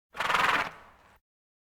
Animal effects snorts grunts farm ambience

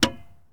Chrysler 300M Hood Latch, Automobiles

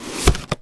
Cardboard Box Open; SFX Kit; Foley - Misc

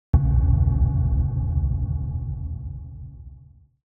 Impact Drum Dull Low Wooden Reverberant Hit; Hollywood Edge; sound designer's toolkit v2

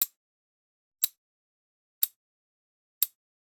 Generic Button Glass Tiny; boom library; The Interface

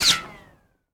hit shot bullet zip; Blind Drive

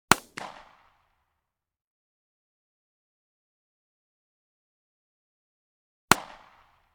9mm Blast Long; Hollywood Edge; Heavily Armed

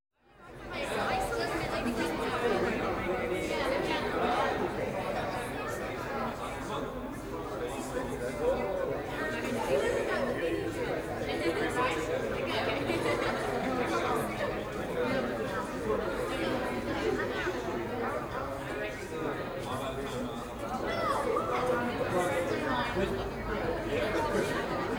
Party

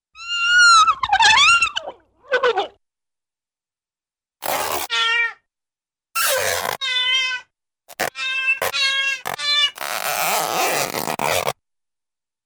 Various Meows & Yowls; Cartoon; Hollywood Edge; Cartoon Trax

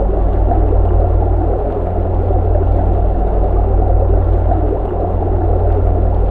Enemy Water Spell Travel Loop; AudioWizards

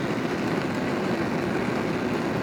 Flame Thrower Idle Loop; Weapons

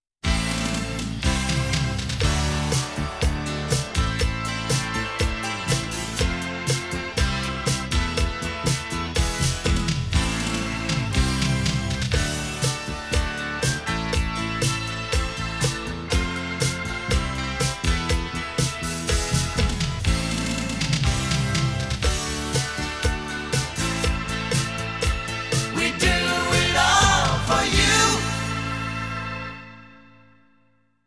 30 Sec. Music Bed With Tag Sing-

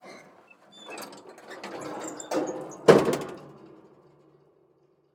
Semi Truck Mac; Large Vehicles; Blastwave

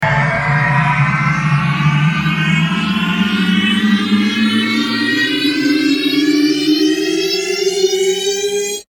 Science Fiction Tention; Tention Makers; RoosterFX

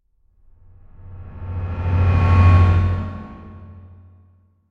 Metallic Whoosh - Appear Vanish; Hollywood Edge; sound designer's toolkit v2

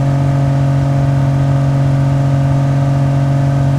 Jeep Wrangler Loop; Trucks

